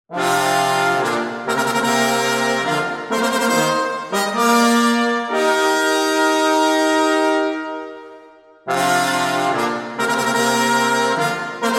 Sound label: Brass Quintet